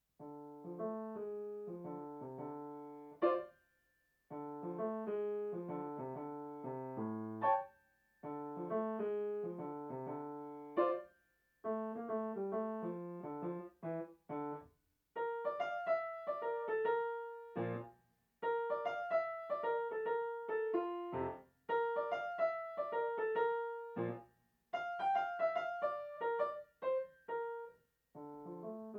suspense; compositions for piano

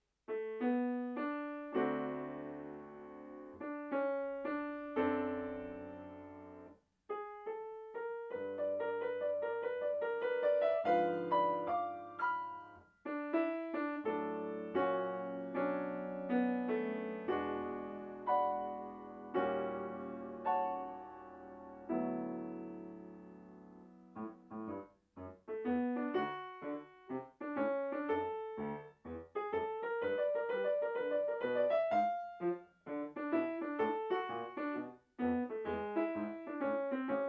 blues

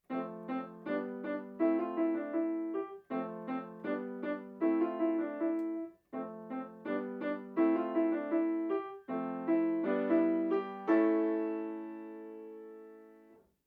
amys disco; compositions for piano

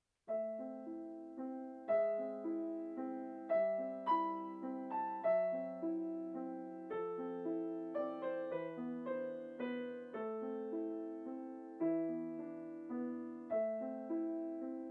waltz

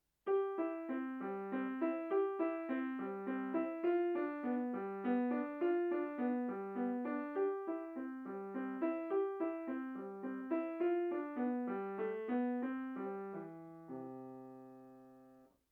waltz; compositions for piano

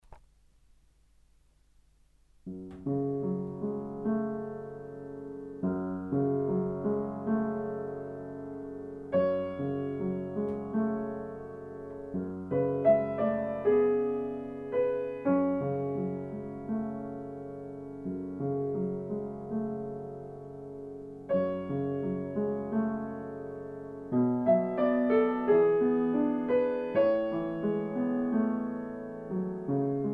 prelude in f minor; compositions for piano

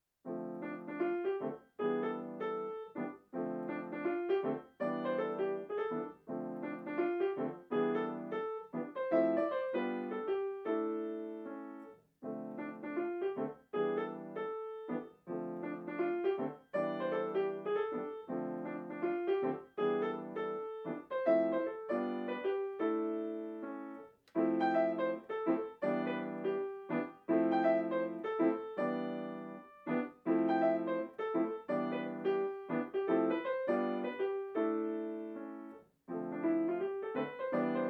vinyl blues; compositions for piano

